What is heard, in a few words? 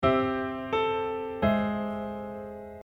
diatonic